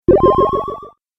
Classic Lo-fi Magic Pain, video game sound effects